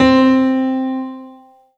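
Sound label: Kawai K11 Grand Piano C4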